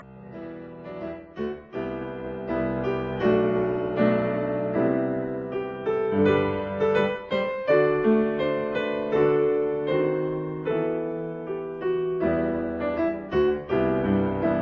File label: piano; finalfantasy11; piano collections